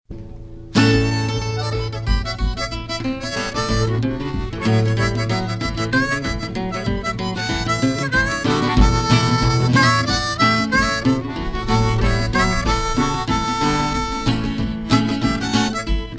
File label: Guitar Harmonica; folk festival; UCSD Mini Folk Festival